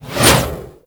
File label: Scythe Whoosh; Reaper